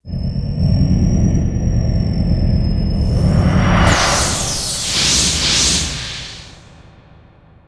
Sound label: Entrapment; RYL 2